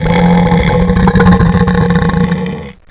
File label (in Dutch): smerig geluid, Dieren; sound effect